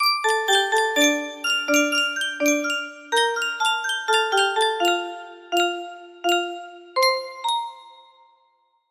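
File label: music box melody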